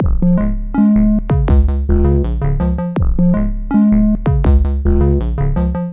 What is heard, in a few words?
playful dots beat melody; SAMPLED MUSIC LOOPS